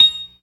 PERC, ORGAN; Keith Emerson Signature Series

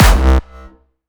Distortion Kicks, Bassdrums

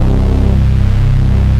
FAT FUZZER, SYNTHS; Pro-Rec - Synthsonic

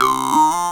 Ooo; Mo' Phatt; Digital Sound Factory

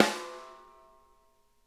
SNARE RIM; Sonic Reality; Interactive Drum Kits

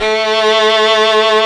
STR SECT A2

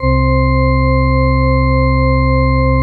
CHRORG, Proteus; Drums

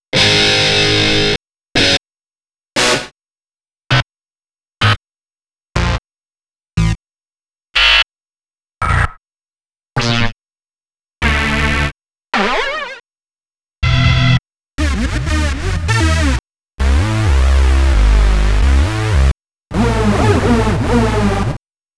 rave buzz; X-Static Goldmine; ravers (chains)

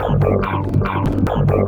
Dirge bass, Funk; Sonic Foundry (Sony Creative Software)

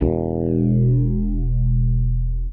Bass Classic, Drum N Bass; Sonic Foundry (Sony Creative Software); Knutrix Drum & Bass

